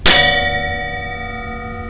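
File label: klanger, bells whistles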